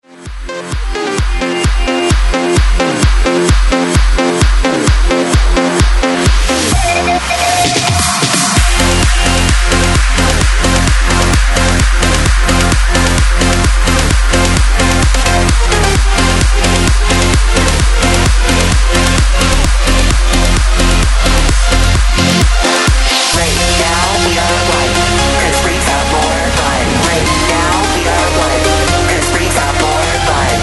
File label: 130Bpm BREAKMIX; DJDOLLS